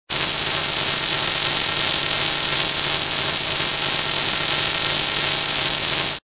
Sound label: electrical power line